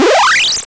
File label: reach goal; Lynda C Game Programming 2